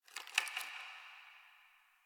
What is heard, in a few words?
Ice Crunch